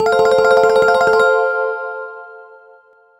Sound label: open; BlackBerry; OS-10